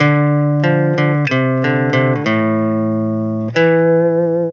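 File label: guitar, Roots Funk; USB Soundscan vol.41; AKAI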